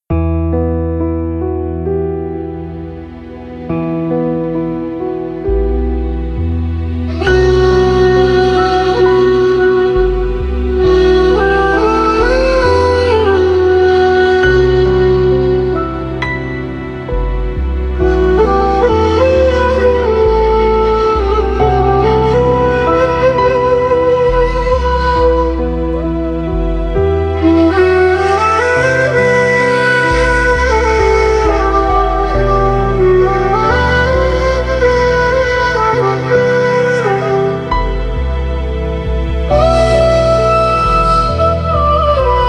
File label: bi kalam ghamgin flute